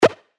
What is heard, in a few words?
PERC D# Apricot, percussion; revealed percussion vol 3